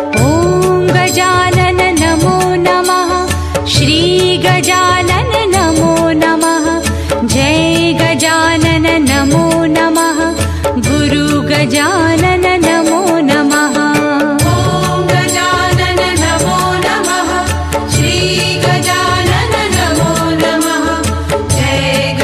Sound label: Om Gajanan Namo Namah Ringtone; god ganesh